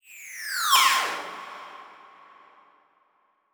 Title Transition Laser Short

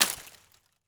Weapon Hatchet Hitting Tree Debris; AdobeCC

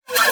Multimedia Internet CD-Rom Flash Wipe Short

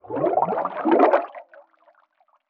Underwater Water Splash Gurgle Bubbles

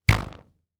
Weapon Bow Snap; AdobeCC

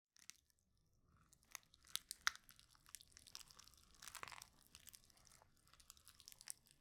Plant Growth; Natural Variety; Varazuvi; GDC Game Audio Bundle

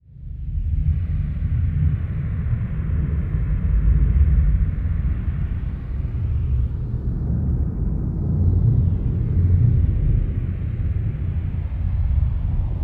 Drone Phase Wind Science Fiction; Production Element Imaging; AdobeCC

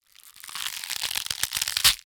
Horror Scary Bone Cracking And Breaking

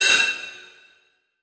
Multimedia Internet CD-Rom Flash Blast

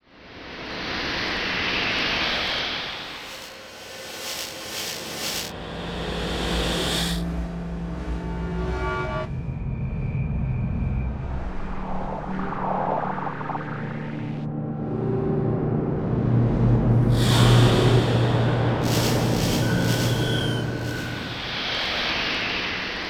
Electronic Whoosh Reverse Feedback Digital

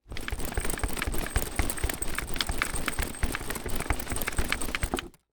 Household Briefcase Rattles Fast

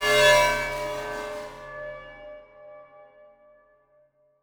Transition Whoosh Alarm; Production Element

